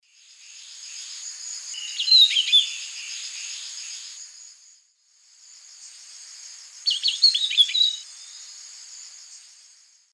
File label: Basileuterus fulvicauda, subsong; S. America; Eastern Lowlands